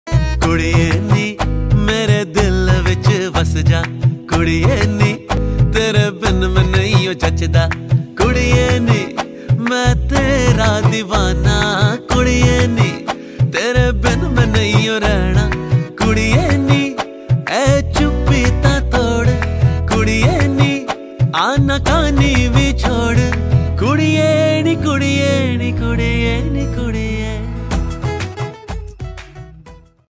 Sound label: panjabi ringtone